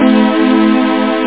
chord

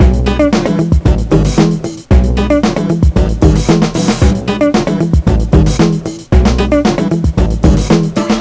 funk

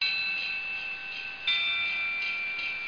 chime loop; nissan

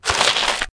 bod strk, bugs; Starship Troopers

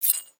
BUTTON

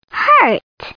hurt

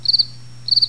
cricket

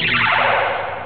Electric Energize; Realmz 2.2

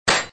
Tink; reel gold

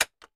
click; 1944 Battle Of The Bulge; english

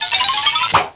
Open

